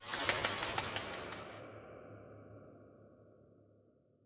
sewer bat flap; garrysmod

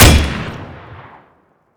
m16a4 knife; garrysmod

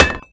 impact nade; garrysmod

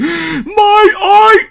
zombi idle; garrysmod

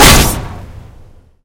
spear shatter; garrysmod

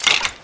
bow reload; garrysmod

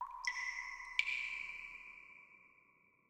sewer drip; garrysmod

